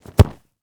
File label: Soccer Ball Kick; SFX Producer